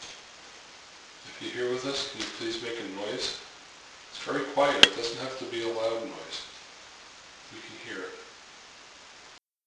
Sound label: evp plink